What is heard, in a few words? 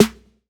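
fluffy snare sound a key, tuned to the A note; Duration 0:01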